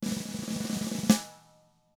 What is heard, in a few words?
ROLL, SNARE ROLLS; ILIO; Double Platinum Drums 2